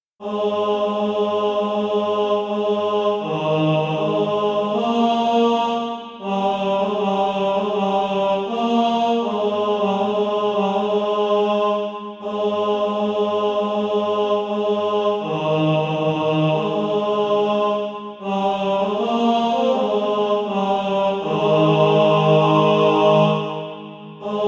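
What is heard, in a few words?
tenor, Practise Music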